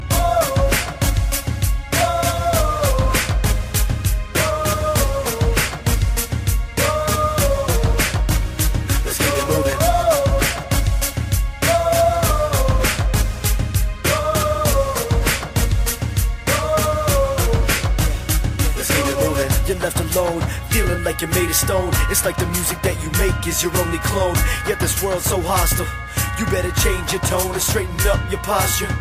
Whoa Ohh

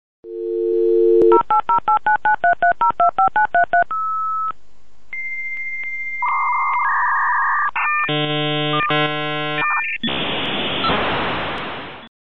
Modem 56k

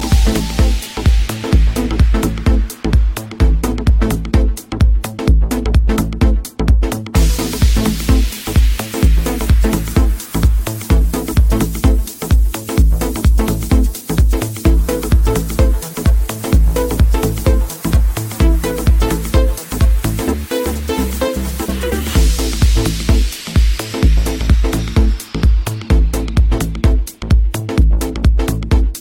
Nice Tone

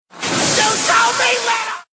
Scream

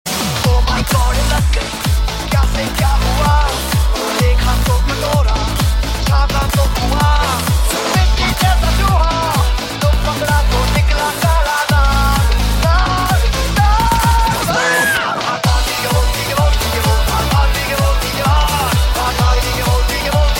Beats; Bose

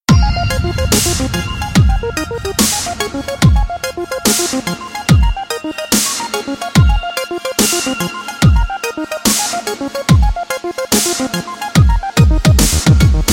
Electro

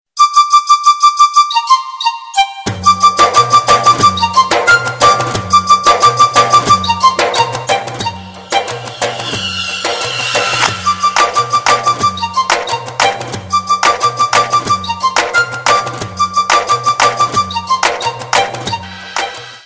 Ritm Ring

